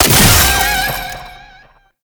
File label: Pistol Last Shot; Weapons; Warframe; Tenno; Temple Signature